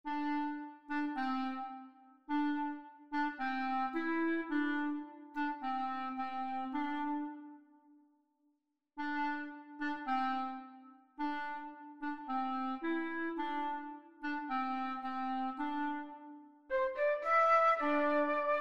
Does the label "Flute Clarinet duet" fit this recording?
Yes